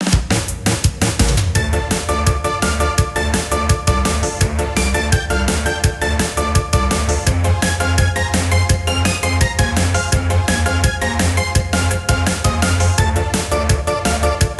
Techno Factory Sample

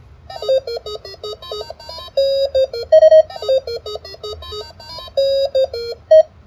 Alarm Clock; RHYTHM Kirby

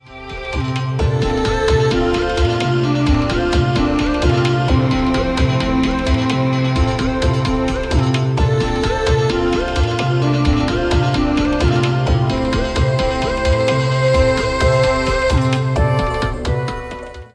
Synth

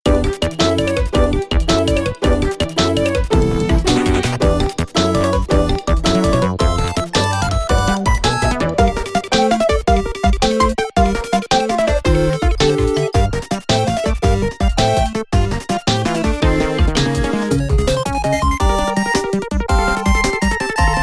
Toy Rhythm

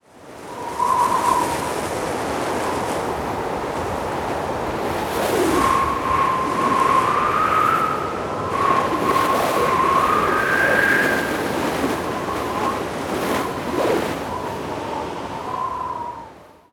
Wind Soundscape; KatFL

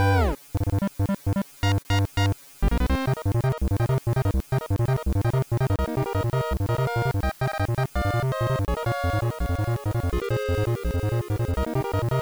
Boss Battle Theme; KSS; KSSU

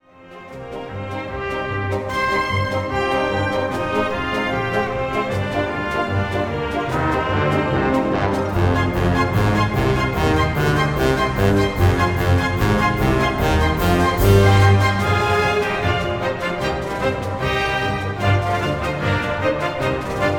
Orchestra Concert; Kirby

